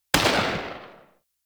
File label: Tail A; Tenno; Dex Sybaris